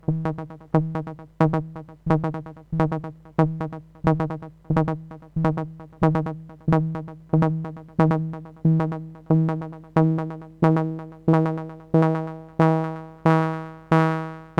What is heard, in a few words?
analog SAW